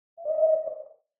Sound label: Owl Call; SoundsCrate